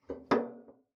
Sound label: Ping, Kitchen Toaster